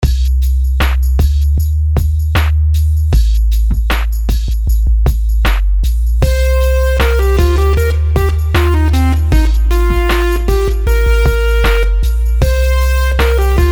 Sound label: melodies simple 16s Cmin; melody